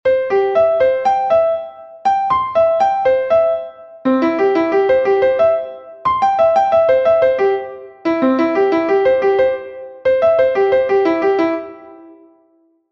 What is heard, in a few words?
triad training